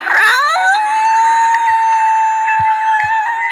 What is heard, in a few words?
claws loud meow; scarface